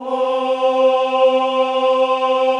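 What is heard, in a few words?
choir C3, men; Sound & Vision - Gigapack I CD 2 (Roland); Men-Combi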